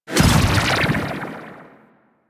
Staff Jab Hit; Warframe; MonkeyKing

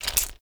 Flamethrower Reload; Warframe; Weapons; Ember Deluxe II Flamethrower Skin

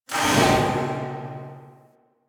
Galleon Electric Amb; Ambience; Warframe; Grineer Galleon

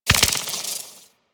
Mortar Mine Drop

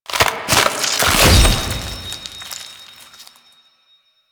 Finisher Rip Gem; Boreal; Warframe; The New War; Archon

